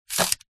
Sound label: leisure foosball plastic score slider single slide